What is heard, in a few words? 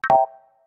sosumi